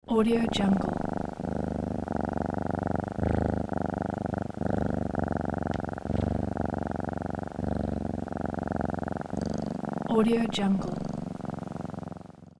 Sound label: cat purring; sound ideas